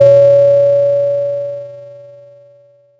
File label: pop, Sound of a doorbell